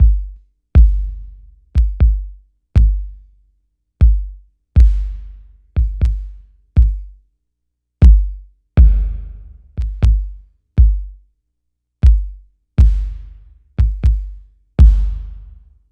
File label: Structured Rhythm, IDM Rhythms